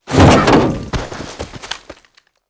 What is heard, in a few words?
npc car slide death, foley